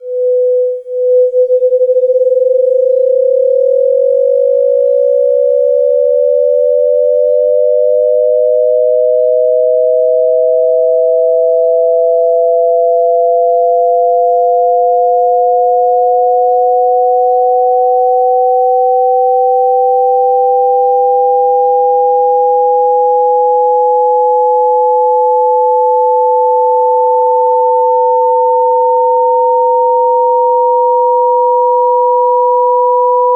Combination Tones, si sentono due frequenze di cui una è fissa, mentre l'altra glissa